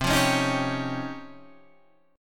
C sharp Major Sharp 11th C

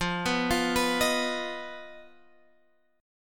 B-Major Flat 5th F

